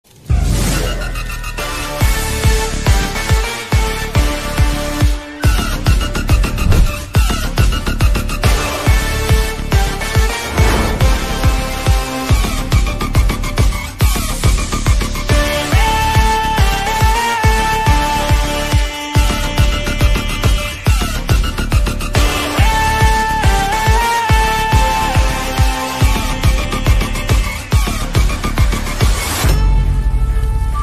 bengali movie bgm ringtone; mirza; ankush hazra

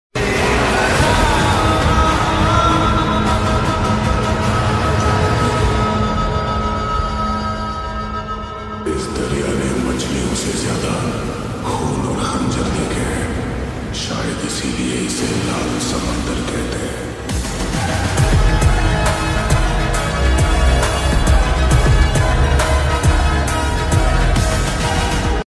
lofi bgm ringtones; devara; attitude action ringtone

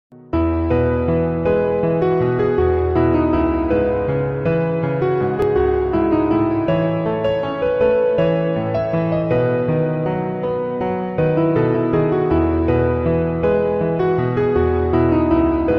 ringtone piano instrumental; ms dhoni the untold story

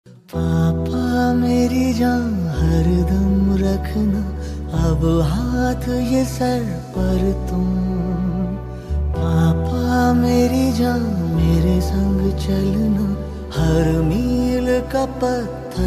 song ringtone call ringtone bgm

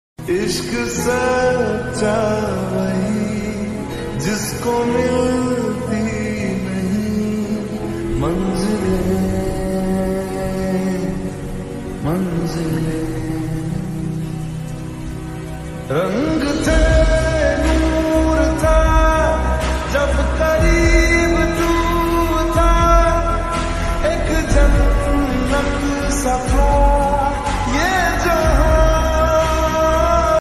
ringtone slowed reverb ringtone lofi ringtone

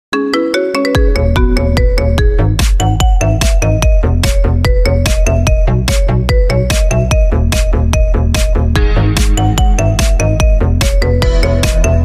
marimba remix ringtone

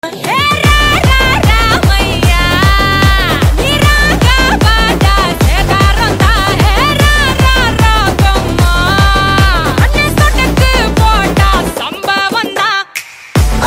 dj mix

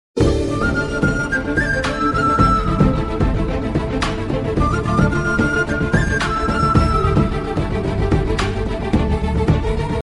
fluet bgm ringtone; manam

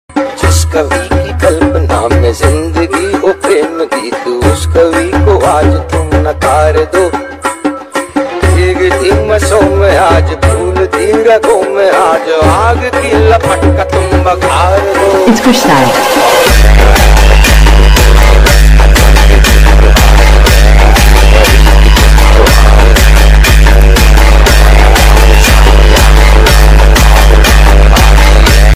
bgm ringtone dj song

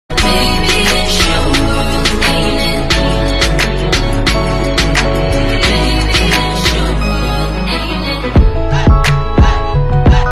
saxy groove music

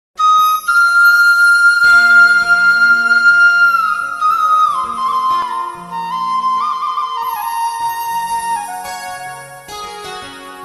instrumental ringtone bansuri ringtone bhakti

